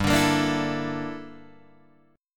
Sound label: G Minor 6th Add 9th G down Guitar DADGAD